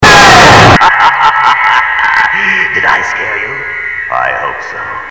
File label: Lullaby